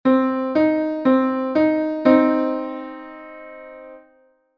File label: minor 3, musical intervals